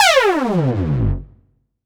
Synth Shot F#; Mutekki Media; Vengeance Electroshock Vol.2

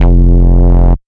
Bass Shot F

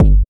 Bassdrum

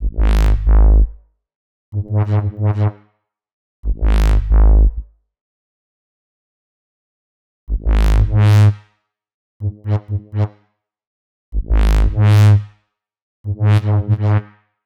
125 G#m Bass, Complex Electro Synths; Prime Loops; Transport

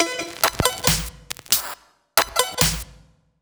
Bpm138 Bagpipe Fx Loop, Dubstep; FX Loops

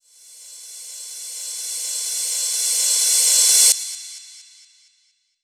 FX Uplifter

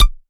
Perc, Dubstep One-Shot Drums

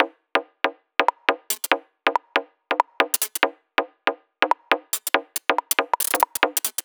140 bpm Perc Loop, Dubstep; Stupid

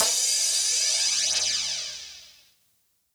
Crash, Dubstep One-Shot Drums

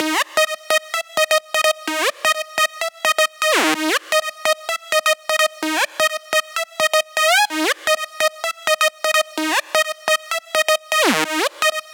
Dirty Dutch Kit Melody; Mutekki Media; Vengeance Electroshock Vol.2; Dirty Dutch Kit 1

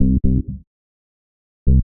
126 C BASS, Funky; Loopmasters; Funky Underground Vol 2; BASS LOOPS

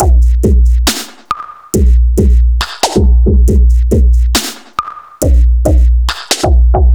Bpm138 Break, dubstep drum loops

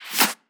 Claps & Snares

